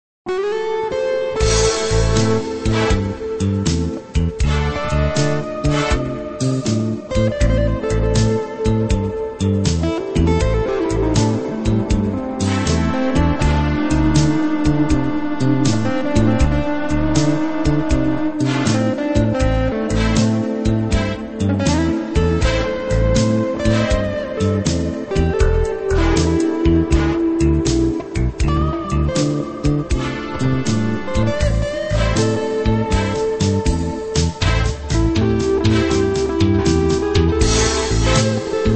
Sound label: Blues